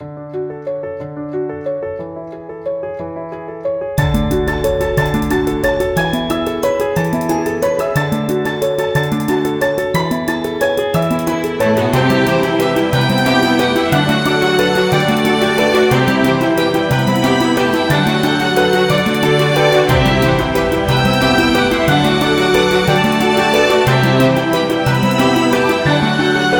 Emotional Piano and Violins, Strings; sweet girls wondering waltz

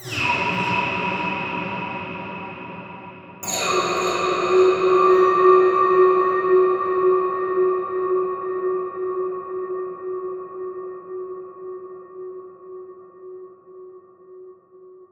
Ambience

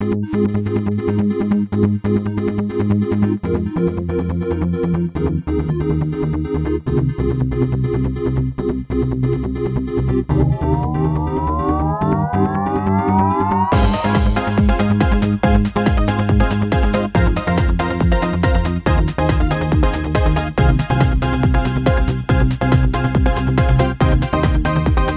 fast pulse

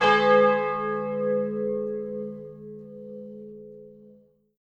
death bell sound effect

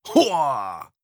effort grunt male

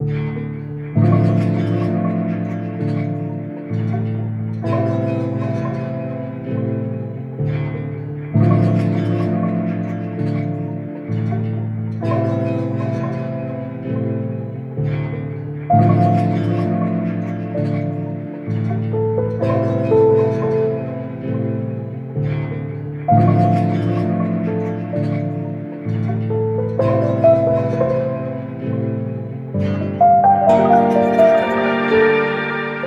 piano room

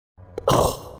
zombie damaged; zombie pack sfx

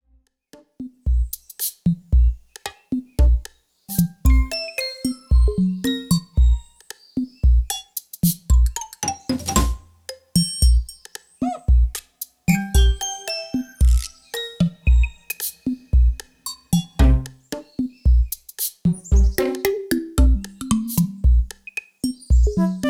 Insect Factory (Wii-style music)

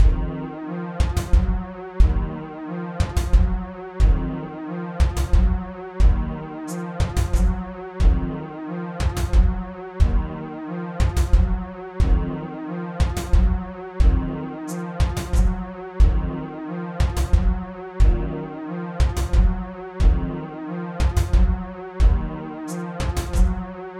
alien invasion loop; alien invasion track

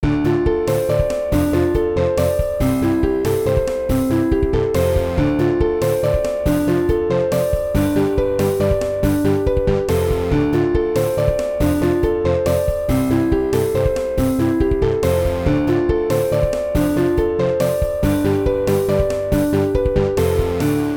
short interlude

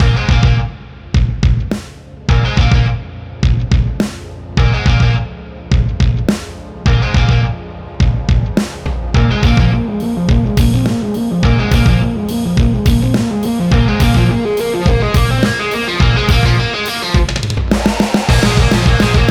bravery demon bpm210

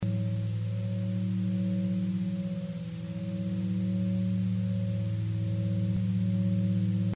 tube

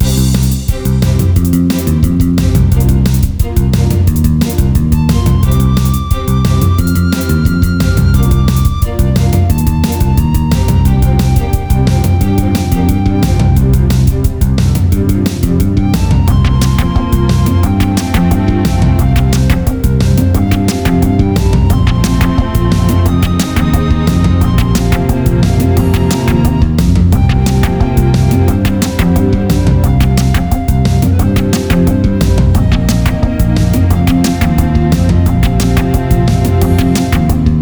grassland level

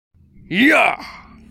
player sfx

